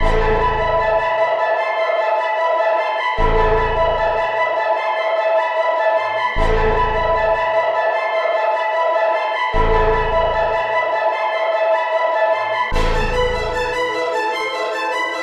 151 BPM G# Min, Trap Melody Loops; Astro; Cymatics; Nightmares

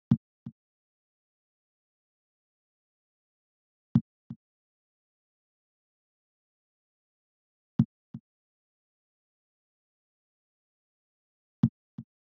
Drum Loop - 125 BPM Percussion; Cymatics; Antidote Drum Loop

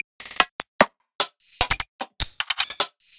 Perc Loop 150 BPM; Future Bass; Drums; Top; Heater

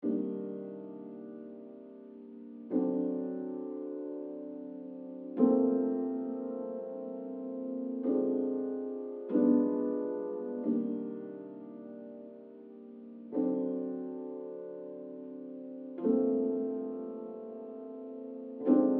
90 BPM G Min Piano; Cymatics; Thick & Thin; Infinity Melody Collection

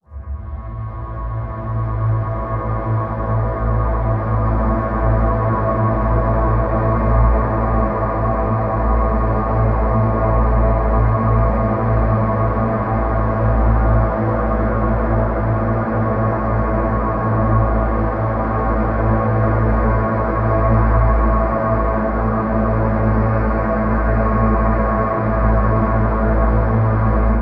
Synth Drone Am 70Bpm; Construction Kits; STEMS; Ghosthack; SkyRealm; Kit 20 - Sea Of Souls

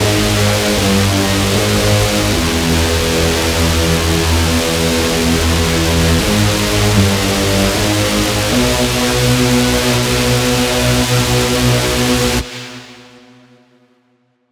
lead loop G#min 155; Music Loops; GhostHack

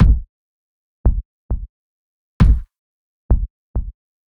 Kick, 100, Crackled; Drums; Separated Drum Loops; Kicks; Ghosthack; Abstract Drums Volume 2; Drum Mega Bundle

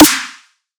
Riddim Snare

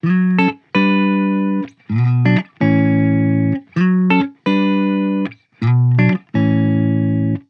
Funk Chord Loop, 128 BPM D Maj, Guitar Loops; Instruments; House

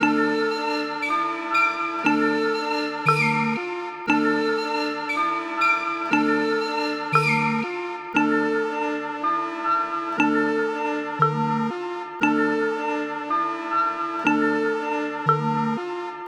118 BPM D Min, Melody; Cymatics; 2022 Melody Collection Platinum Expansion

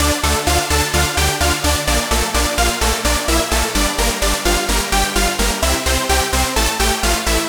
Super Saw Loop - 128 BPM Dmin; Synths; Loops; Drop Loops; Cymatics; EDM Starter Pack

